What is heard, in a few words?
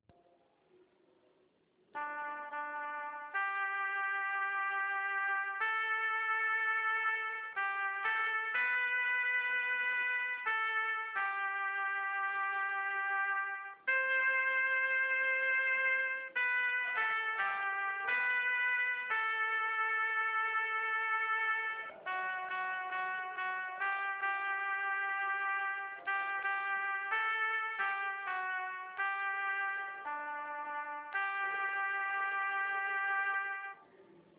piano